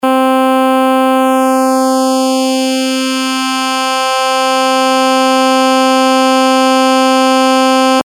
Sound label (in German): puls cutoff br